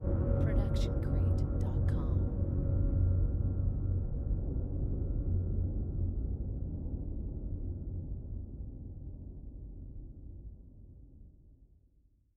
Creepy Low Bell Hit; SoundsCrate

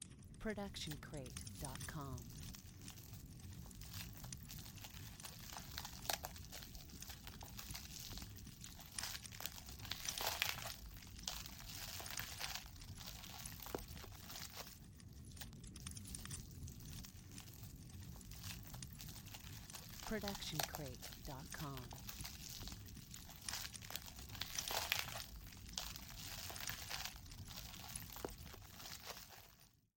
Fire Sizzle; soundscrate

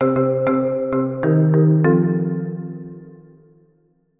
Marimba Ident; SoundsCrate

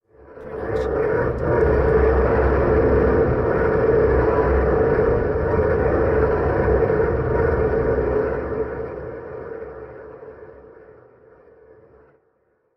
horror low rumbles; soundscrate

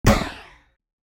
sci-fi blast

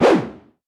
bullet impact woosh

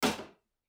Metal Footfall; soundscrate

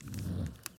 torch swipe; soundscrate

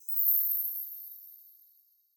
fairy dust; soundscrate

